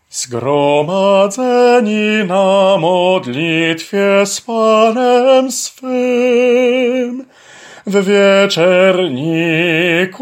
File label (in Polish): TENOR